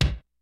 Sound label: kicks snare, kid; Kid Nepro; Elite; KICKS SNARES